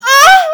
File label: ahhhhhh